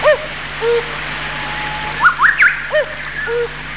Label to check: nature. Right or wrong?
Right